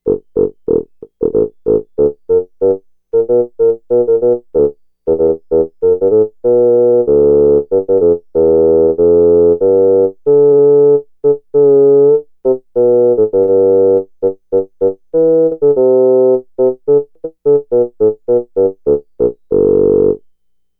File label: Bassoon, Roland SH-2000